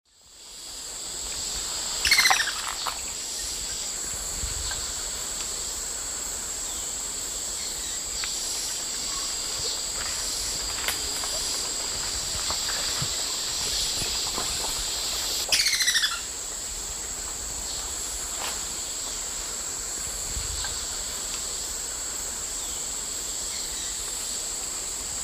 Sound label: Leptopogon amaurocephalus, call; S. America; Eastern Lowlands